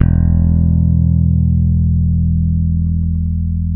rock E, rocker, bass; Spectrasonics; Bass Legends

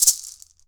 PUILI, HAWAII PERC

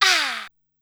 "Haaa", Korg Wavestation-SR single hits; Waves 456-484

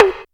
MISC, Skanky Perc; E-MU Formula 4000 Series Vol. 1; Hip Hop Nation

